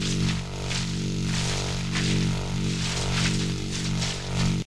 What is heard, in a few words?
Crystal Blockedtower-Loop, crystal blocked tower loop; Frog Infuser